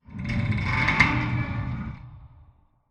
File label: crane movestart metalstress; Titanfall 2